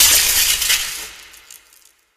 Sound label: spark, coop electric; Portal 2; Source Engine; video games; coop bot